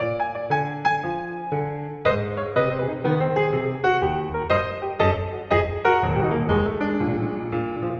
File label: piano wet impulse; wizoo